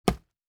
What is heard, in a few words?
Wood Chop, Crafting; Survival Game Sounds - Lite Edition